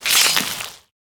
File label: dismember